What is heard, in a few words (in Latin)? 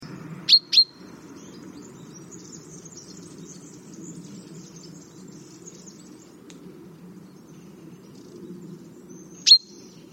Fringilla coelebs; SETE